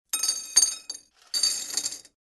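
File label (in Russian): звуки столовых тарелок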